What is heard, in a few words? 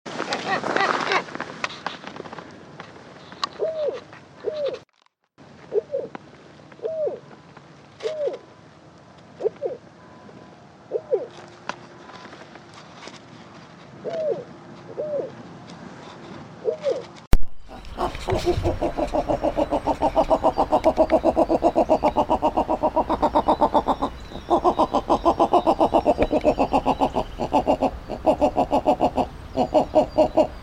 Snowy Owl Sound